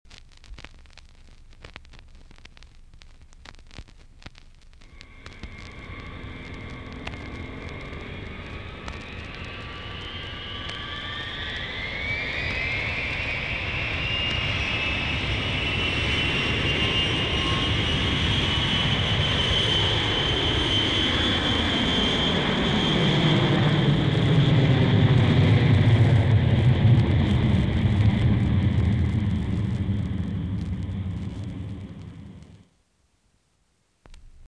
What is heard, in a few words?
jet